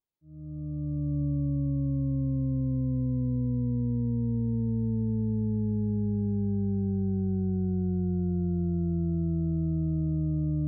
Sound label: risset circular glide